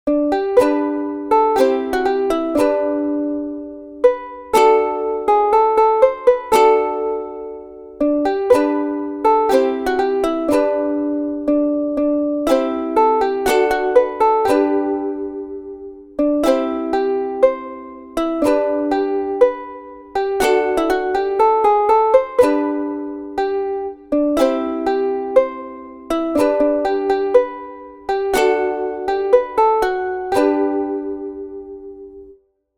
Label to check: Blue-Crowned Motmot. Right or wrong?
Wrong